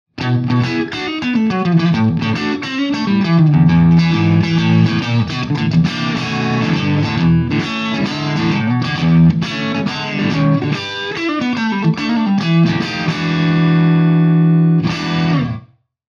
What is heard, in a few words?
Micro Dark Classic Blues